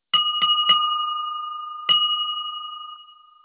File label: Bell Codes, block Signalling